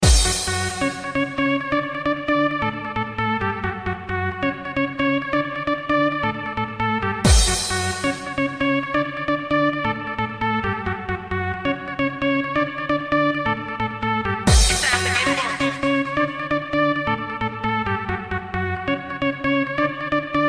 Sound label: Funky Break